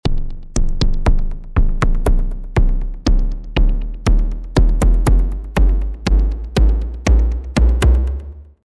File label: DARK DRUM